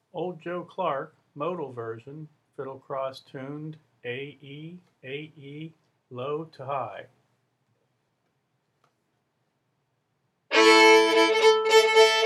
Modal, fiddle; festival tunes

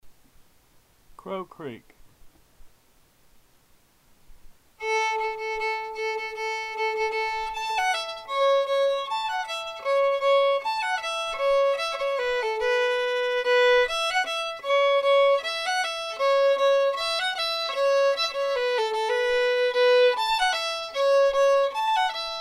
fiddle; festival tunes

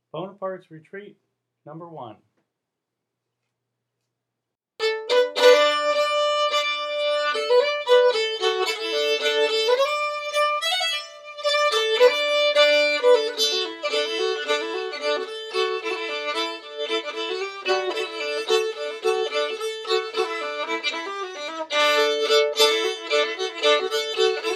fiddle; festival tunes

